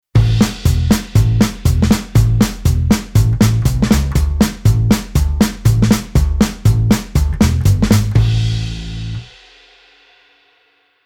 Bass Country

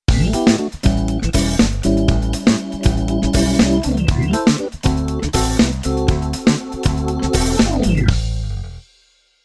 Keyboard Organ Funk

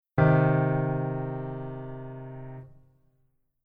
Keyboard Voicing Basic

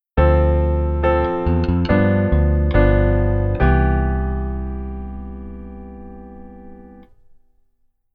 Keyboard Voicing Rootless

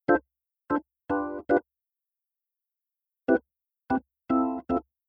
Keyboard Org

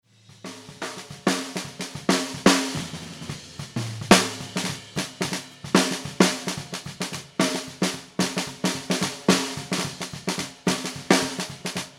Drums SD Btm

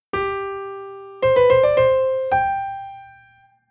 grace note

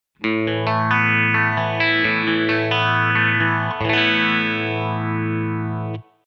guitar Phaser

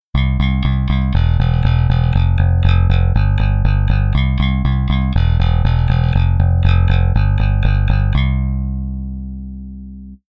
bass effector EQ

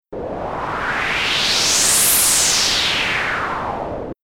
Synth Filter Reso On

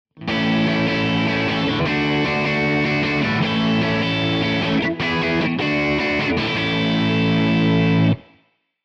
guitar high chord

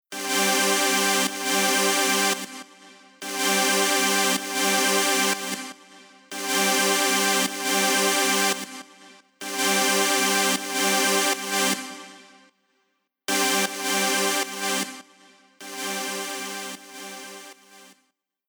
FutureBass Chord Synth Chord

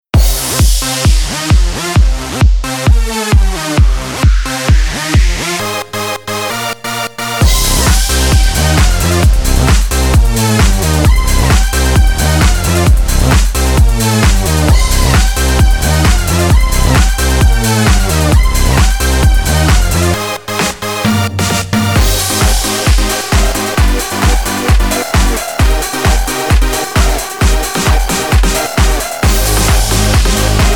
EDM; ABILITY

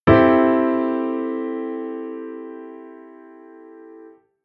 Chord 6

